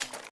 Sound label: Idle metal small